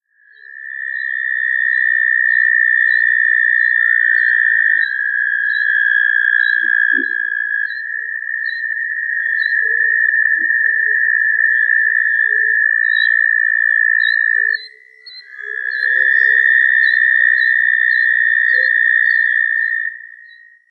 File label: American Toad; herps of NC; anurans